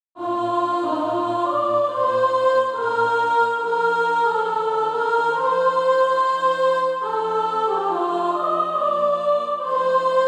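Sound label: sop, Practise Music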